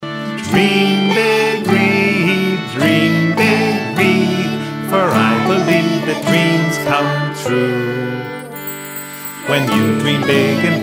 vocal

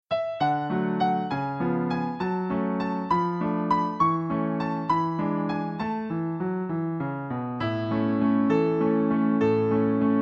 sailing sailing instr; folk

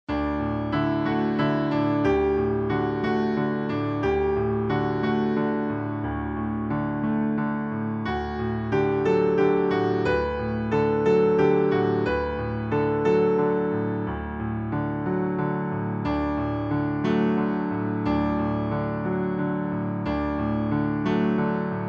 la cloche du vieux manoir instr; French

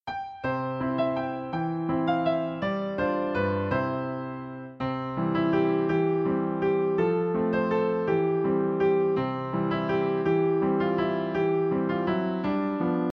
ride a cock horse to banbury cross instr; nursery rhymes

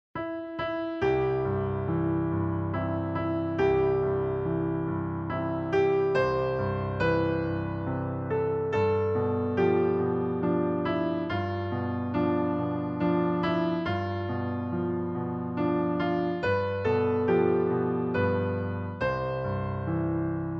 berceuse instr; Berceuse de Brahms

